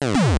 thwomp; smb3

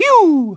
jump; mario; smsunshine

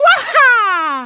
waha; mario; sm64

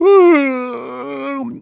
yawn; mario; sm64

